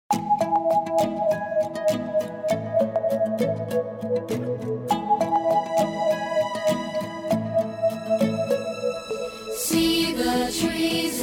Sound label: Traditional Vocal